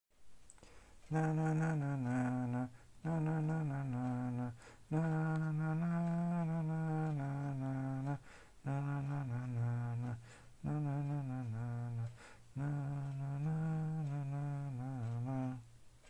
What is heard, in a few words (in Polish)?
melodia, Znana melodia - jakiś szlagier